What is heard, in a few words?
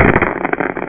DICE